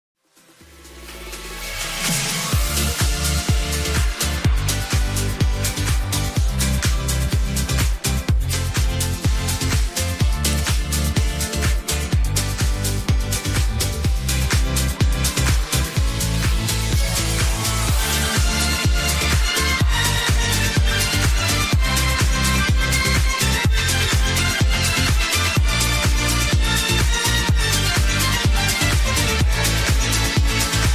Instrumental

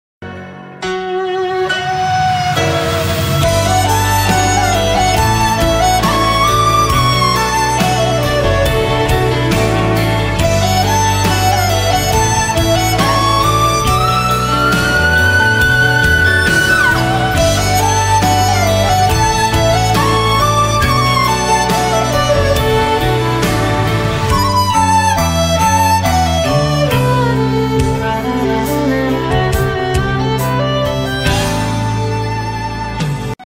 flute

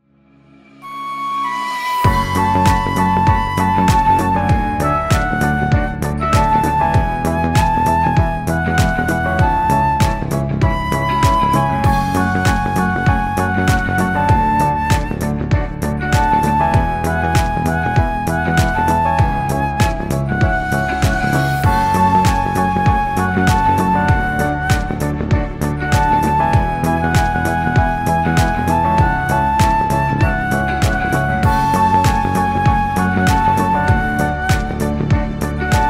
flute

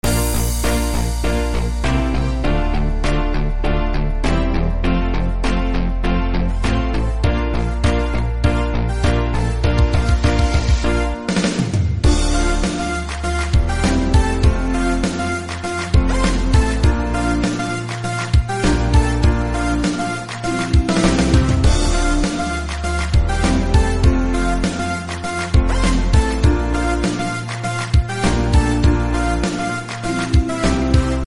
Instrumental

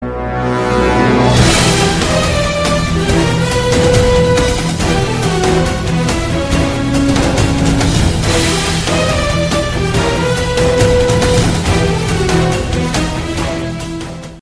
ringtone

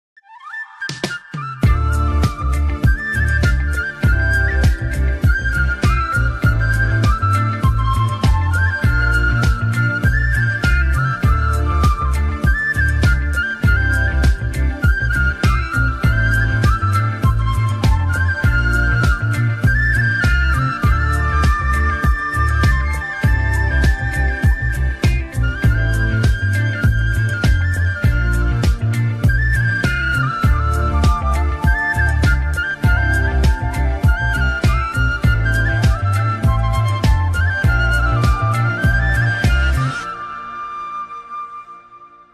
flute

